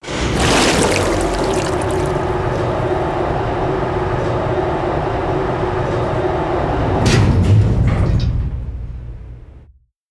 Legs Up

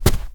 Rug Run; moul

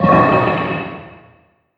Random Metal Drops; moul-assets

